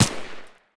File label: mp silencer; STALKER